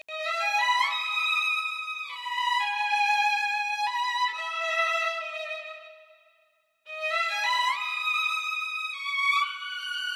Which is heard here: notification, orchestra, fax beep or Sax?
orchestra